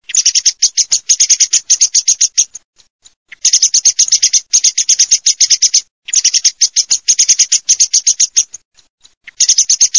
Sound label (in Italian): TORDO